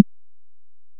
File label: pulse